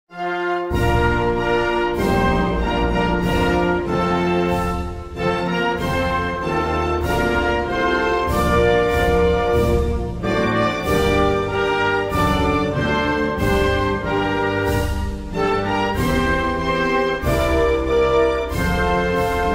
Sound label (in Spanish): Himno Nacional de Tuvalu